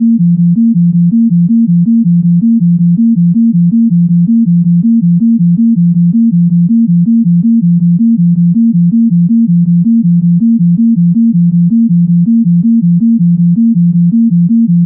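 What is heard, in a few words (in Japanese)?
FSK, 周波数で 0 と 1 をあらわすので、音の“高低”として聞こえます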